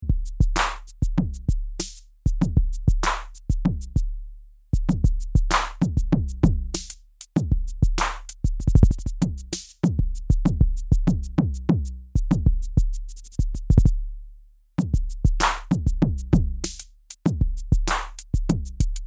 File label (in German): Drumbrute Swing pro Spur